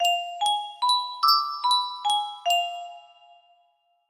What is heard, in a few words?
chimes, melody